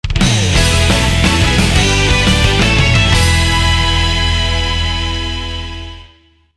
Jingle Win; Super Monkey Ball 3D

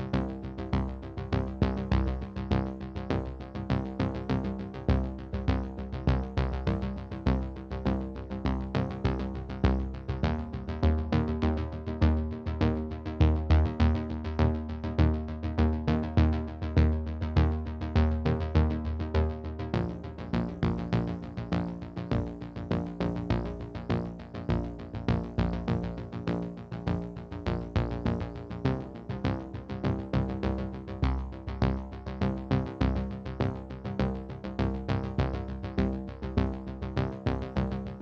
Tension Bass; nova 111; ps vita

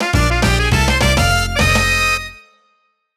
win; donkey kong country 2 fan remastered

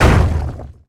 boulder impact stone; Scrat

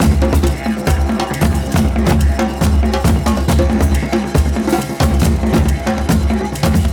Jungle Battle Loop; gamerip; Human Rocket Person

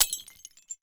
glass break plate

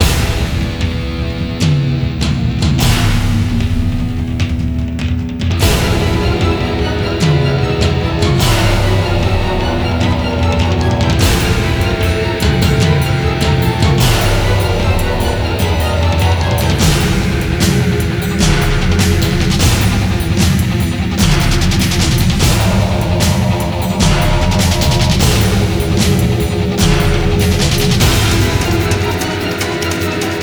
battle dark loop; otome the exorcist

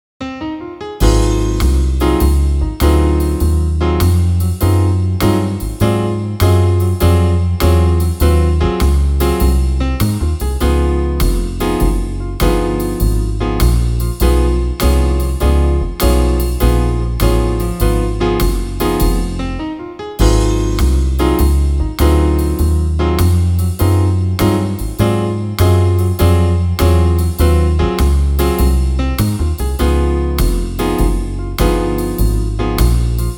Tavern; uncharted waters 30th anniversary complete original soundtrack 2021

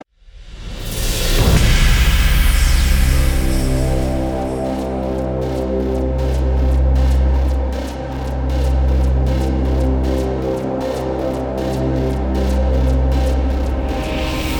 Stinger And Music Bed 156bpm; Drill Boss

